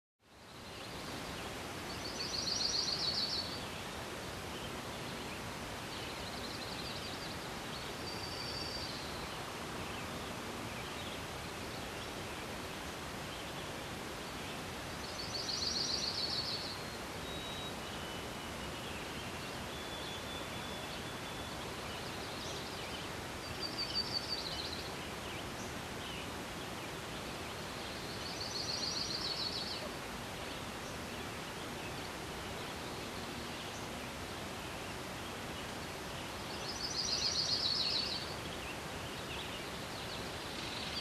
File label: Calm Forest Ambience with distant stream